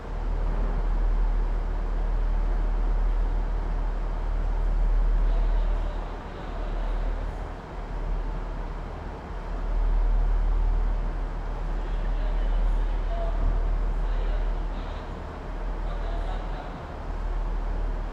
Urban train station drone; urban and suburban; boom library

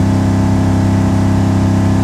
Truck Range Rover Loop; Trucks

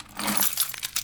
Pickup Keys; SFX Kit; Foley - Misc

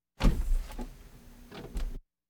Automobiles various

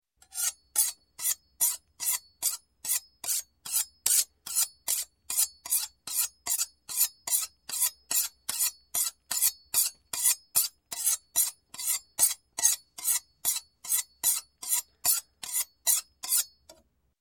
Knife, Sharpen Sharpening, Food; Sound Ideas 2000 Series

